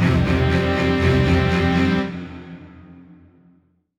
Dramatic Event Bosque

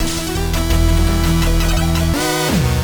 music boss intro; bossdarkskippy

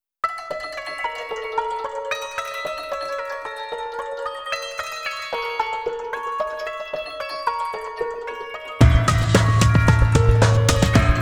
Loading Screen; Der Riese; Call of Duty Zombies; Treyarch

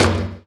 Rim Hit Real

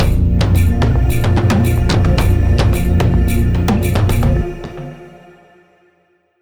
basicMood Cueva Bosque

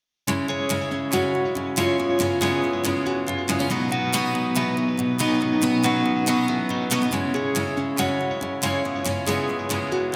Your Ocean (Acoustic); TWEWY

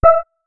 Volume Feedback; Emma Lost in Memories

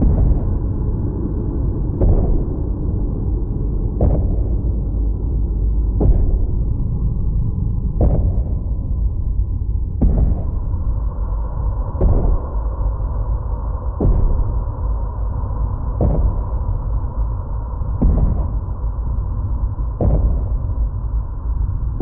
BEAST AMBIENT

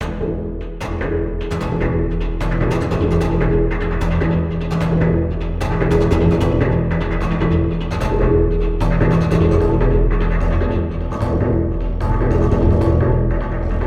Forest Breakbeat

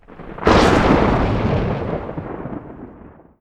Lightning

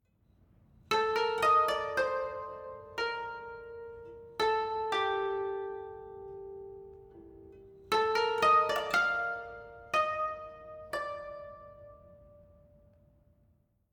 Savannah Music

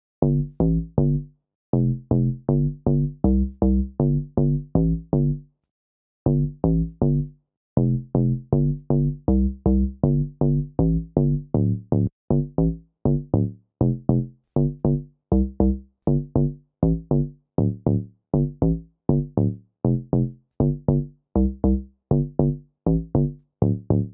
Bassline; chippy

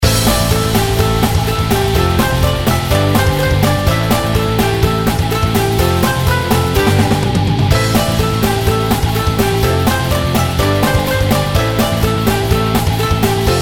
Raid; Mist Train Girls

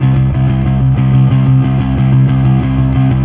Fuzz C4; Torchlight III; PS4

